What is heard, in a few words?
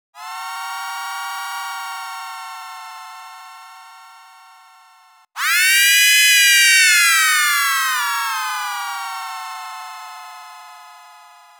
moaning droplets; sonic charge; synplant